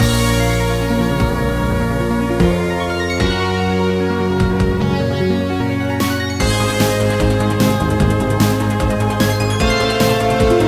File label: Xmas Shooting